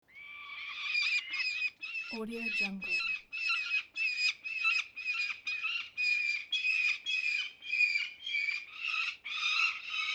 peregrine falcon parent and chick

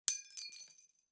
light bulb glass shatter; collanderson

